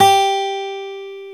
GTR G4A, Dual Guitar, Ac 12+; Club-50 - Foundations

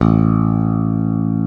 EBASSP A1; AKAI S6000 CD-ROM - Volume 1

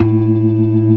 ORGAN, C3 NO PERC; Keith Emerson Signature Series

